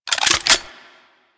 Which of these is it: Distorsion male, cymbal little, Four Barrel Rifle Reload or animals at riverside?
Four Barrel Rifle Reload